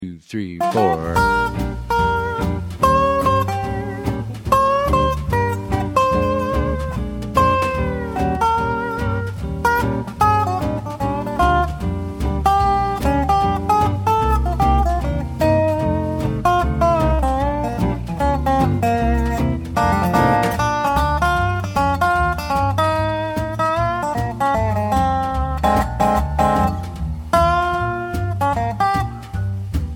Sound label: 12 Bar Blues, jazz guitar